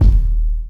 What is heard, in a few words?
Kick; Ableton Live 12 Lite; Core Library; Drums